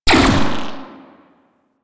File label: Drone Fire; Super Drone Attack Osprey